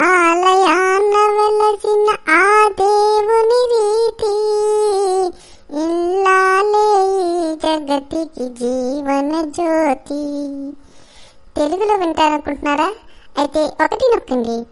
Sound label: Telugu funny ring; ringtone